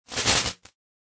lightsaber glitch

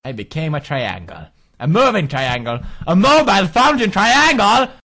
triangle notification sound; pewdiepie

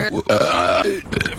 rick burping not that good

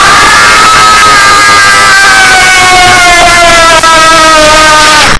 booooooooooooooooooom jumpscare sound

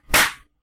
slap; roblox slap battles

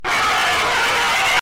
jumpscare sound; piat nochei v ebat 4

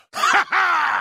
guard laugh; oblivion

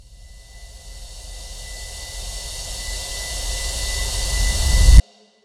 slide in

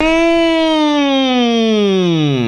mmmmmmmm

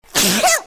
sneeze

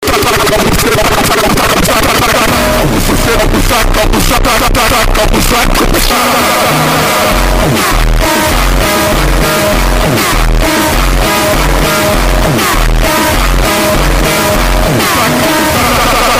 crazy phonk

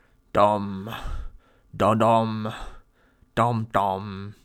singing monsters

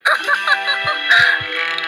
cute laugh

